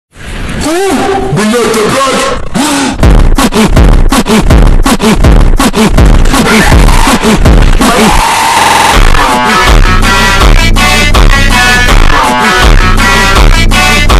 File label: funk super slowed